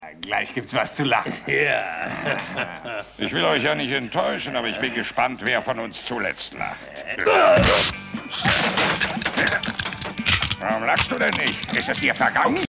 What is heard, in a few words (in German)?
lachen